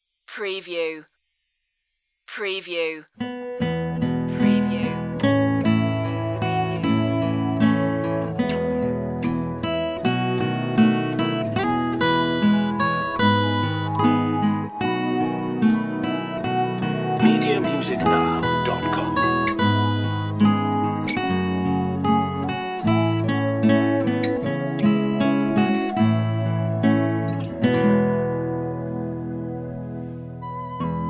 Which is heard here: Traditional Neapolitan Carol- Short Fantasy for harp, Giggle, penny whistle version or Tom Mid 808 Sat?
penny whistle version